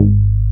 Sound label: bass Croma G#2; Best Service Gigapack 2